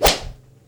slap hit; tf2

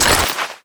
wind up, weapons; tf2; tomislav

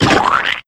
shark explode; sharks; tf2; explodeintosharks

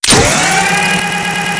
snail gun wind up; tf2

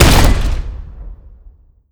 revolver shoot; tf2

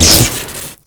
lightning gun zap crit, weapons; tf2

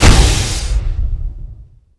grenade jumper explode; tf2

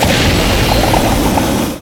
rainblower start; tf2

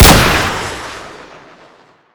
shotgun incendiary shoot; tf2

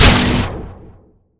radio gun shoot; tf2

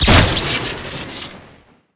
crossbow single crit; tf2

